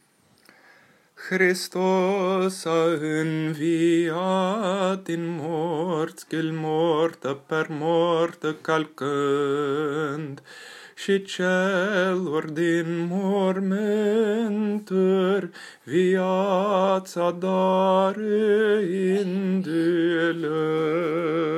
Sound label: Christ is Risen Romanian